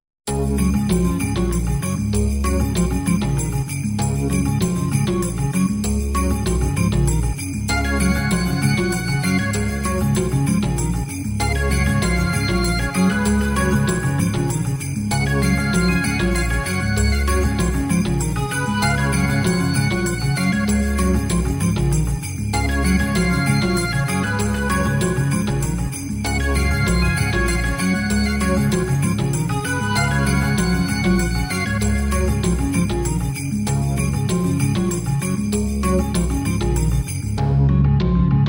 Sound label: Velocity Organ; Pay it Forward (score)